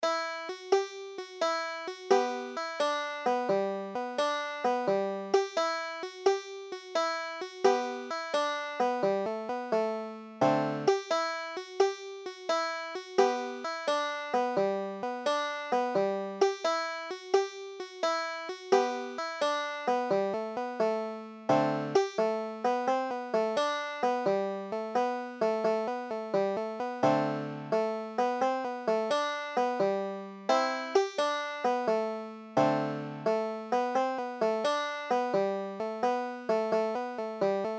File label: banjo